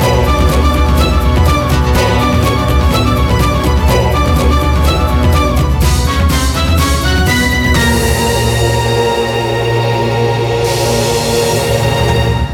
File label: TENSION END NO LOOP